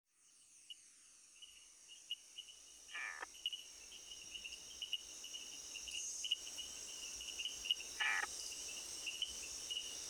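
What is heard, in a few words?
summer night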